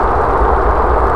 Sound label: surface wind; vcmod